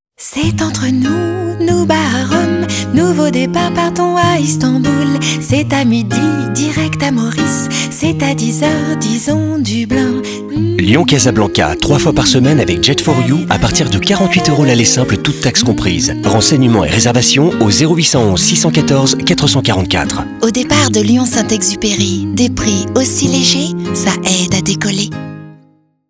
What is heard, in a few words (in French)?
Chantee signature; St Exupery Aeroport